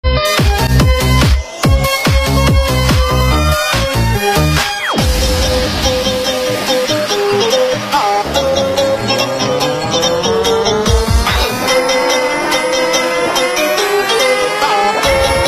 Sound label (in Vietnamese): LK Nonstop Christmas Dance Bass Căng; Mừng Giáng Sinh